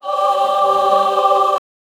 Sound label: digitally distorted angel, angelic